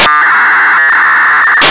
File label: beacon, ham